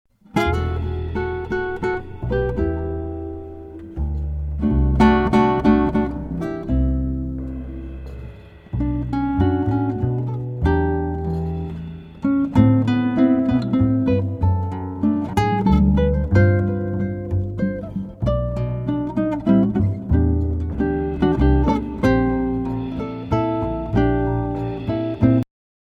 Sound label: Carillon